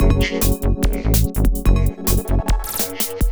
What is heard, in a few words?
dnb, ringtones; Google; NewWave Labs